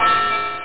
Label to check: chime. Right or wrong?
Right